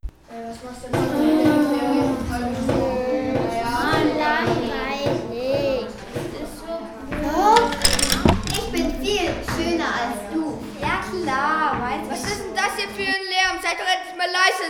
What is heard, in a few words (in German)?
laerm